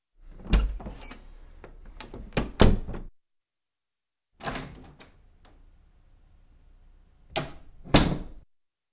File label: Wooden door opens closes 2 times; Деревянная дверь открывается закрывается 2 раза